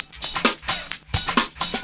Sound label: Dance Grooves